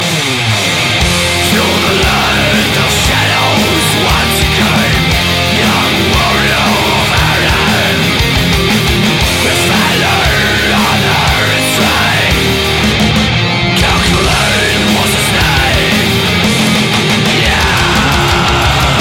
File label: Folk Metal Ringtone